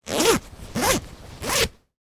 household baby grobag zip up in three stages

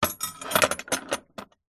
handcuffs metal heavy set down on wood surface

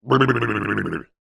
cartoon dazed headshake vocal

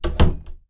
household door wooden internal shut; sound effects one

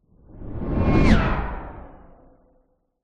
sound design whoosh harmonic into slow motion; zapsplat

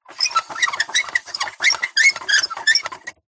industrial plastic handle lever turn squeak; zapsplat

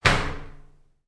household door shower cubicle shut; sound effects one

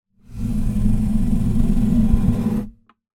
musical guitar acoustic drag along carpet floor

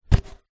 drink take away coffee cup pick up

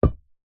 sport ball netball cheap single bounce on carpet

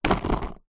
skateboard mini board plastic set down; sport

